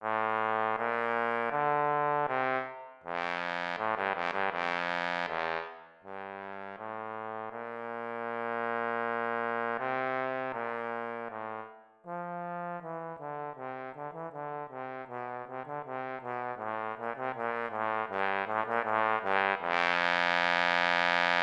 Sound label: Bona for Trombone